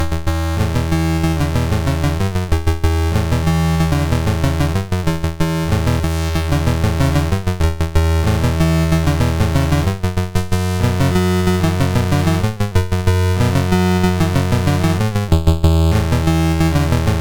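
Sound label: Magicians Ball, MOS 6581 SID chip music, games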